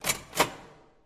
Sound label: open door push bar